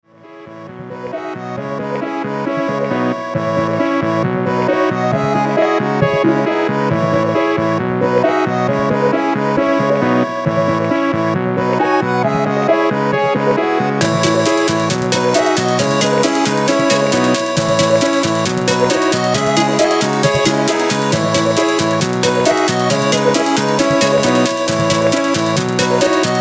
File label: fairy minimal remix